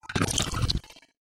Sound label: Vorb Munch; A Robot Named Fight